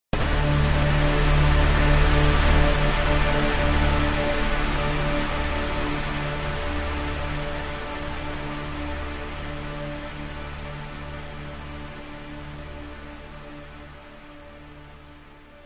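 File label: cinematic big aggressive synth hit buzz; sound design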